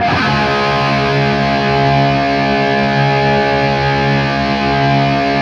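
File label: power C# loop, guitar power chord loop; East West; Steve Stevens Guitar Samples Collection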